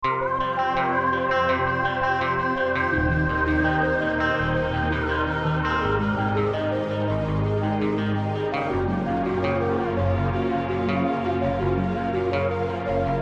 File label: jingle